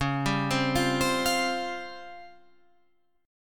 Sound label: C sharp Major 7th Flat 5th Guitar Drop D